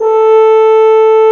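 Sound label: french horn; FMA FRHRN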